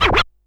scratch; foxdot default

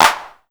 Clap; Pyrex; pitchglitch

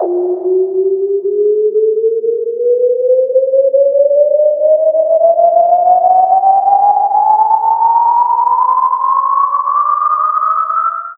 Riser Sine Rise

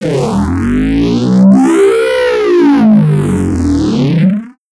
Weird Reese Long

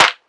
Clap Hand; pitchglitch

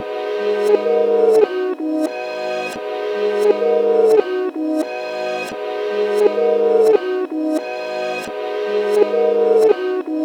Melody 174bpm Gbm, liquid; DNBACADEMY

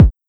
Kick

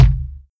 Kick

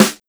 Snare Jungle TD7; pitchglitch

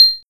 Bell Small Bell Techno

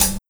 Hi Hat Closed; Eastern Hop V1

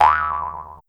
Perc Mouth Drum

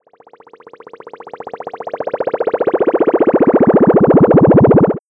Riser Hi Tech sfx

